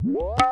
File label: tape fx, scratch; Ambient Grooves